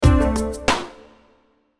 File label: Hit Stab Keys Drums; Stabs; Blastwave